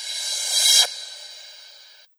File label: Reverse Cymbal